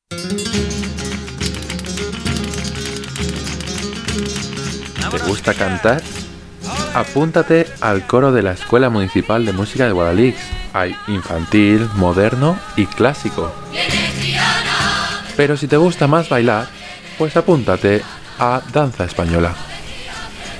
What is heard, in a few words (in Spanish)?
CORO DANZA